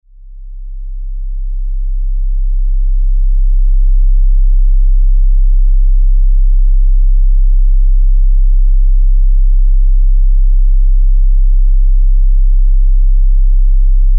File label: 33hz sine wave